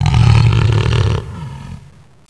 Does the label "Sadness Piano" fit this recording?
No